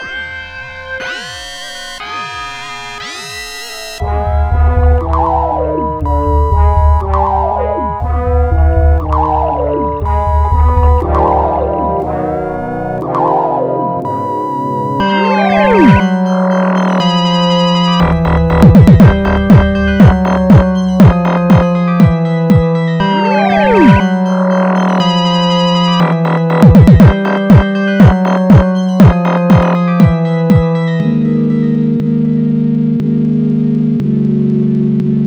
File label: Sinewave